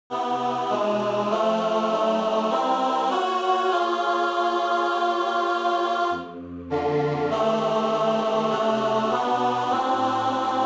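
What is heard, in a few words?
Baritone, barbershop tags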